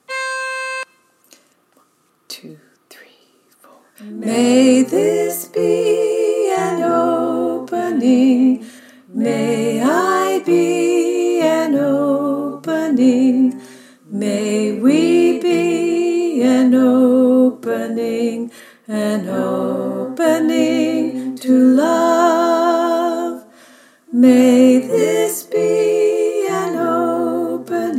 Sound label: alto melody, choir; freedom choir